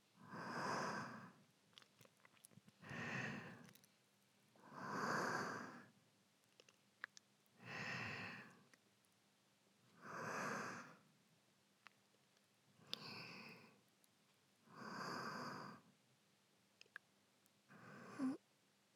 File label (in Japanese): 寝息; ASMR